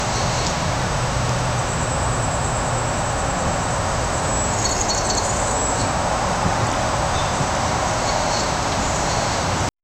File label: the unsettling sound of, cricket chirping